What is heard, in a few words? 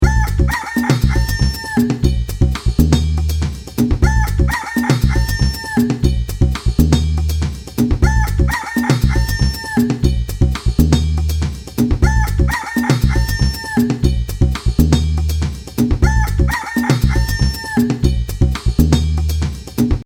Funky Cockadoodle; Ringtones